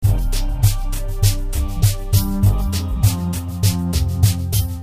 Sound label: Groove 100 F